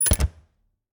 Refridgerator, old fashioned, wooden, steel, brass clasps, hinges, close, squeek, piercing; Eiravaein Works; Vaeyan IV